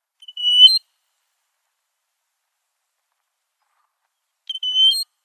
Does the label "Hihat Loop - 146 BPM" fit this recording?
No